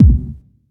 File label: boomy kick drum B key